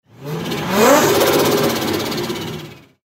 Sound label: turbo blowoff 350z